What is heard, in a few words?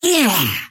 pain big